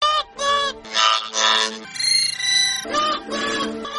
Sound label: noot noot realistic